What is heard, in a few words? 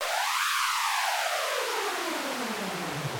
Virus Screech